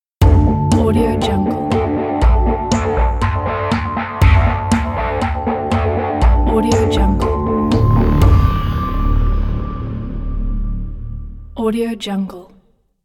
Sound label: vibrating metal case